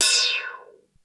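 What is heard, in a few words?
Hi Hat Open, Korg DSS-1 Drums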